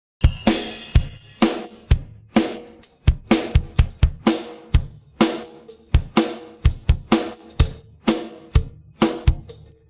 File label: pattern, drums